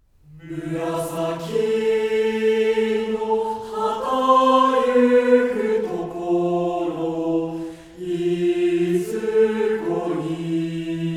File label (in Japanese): 混声合唱; 霜に乱るる